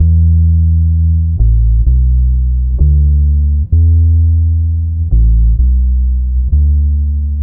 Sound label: Bass, Trip-Hop; Loops; Sonic Foundry; Rondo Brothers Trip-Hop Loops & Samples